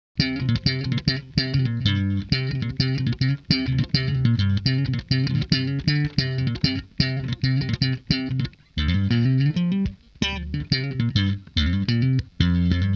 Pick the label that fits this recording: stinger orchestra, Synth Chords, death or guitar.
guitar